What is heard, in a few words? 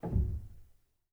rel, Grand Piano; bitKlavier Grand; Piano Bar